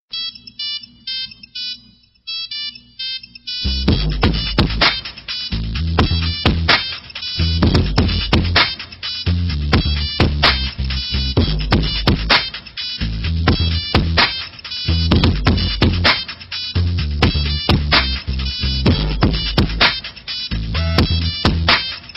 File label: Gangster Nokia Tone